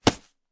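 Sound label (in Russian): удар по телу в куртке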